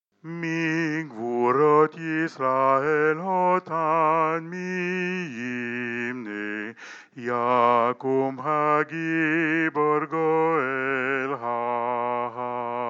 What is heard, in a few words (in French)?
Soprano chanté; Mi yimalel alto chanté